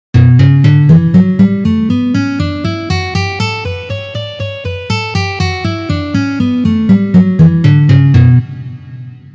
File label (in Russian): Gamma Si minor pozitsiya